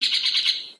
new birds, ambient outdoor

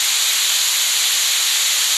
steam, underground; ambient

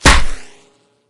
wood, bullet collide

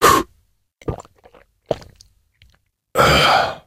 drink

